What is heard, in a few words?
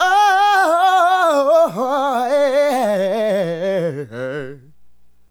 Adlib, Hip-Hop and RnB Vocals